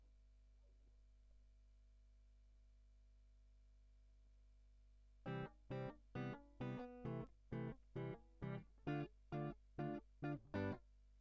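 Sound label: Guitar Line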